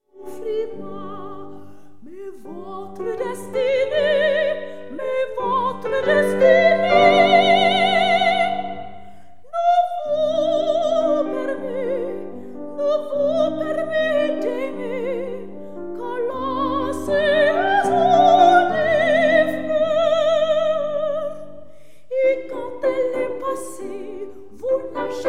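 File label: arriette for voice and piano; wolfgang amadeus mozart; oiseaux si tous les ans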